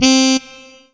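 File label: Yamaha TG500 Brass Mute C4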